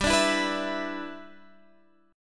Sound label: G 6th, Guitar, Standard; G6 strummed